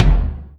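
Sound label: drum reverse bass impact F#3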